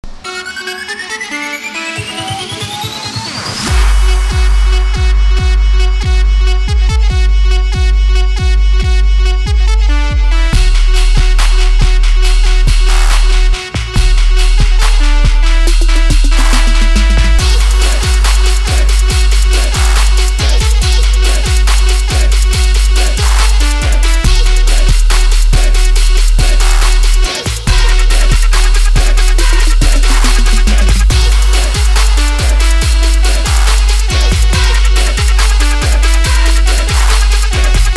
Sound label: trap remix